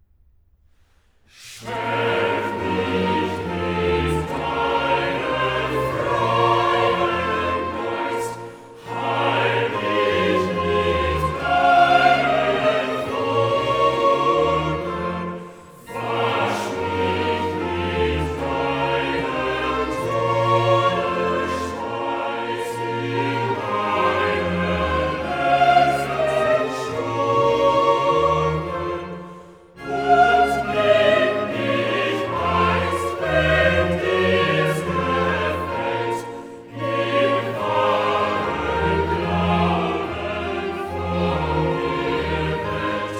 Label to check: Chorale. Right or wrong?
Right